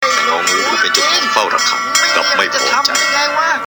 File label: cartoon